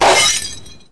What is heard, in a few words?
glass; christmas lights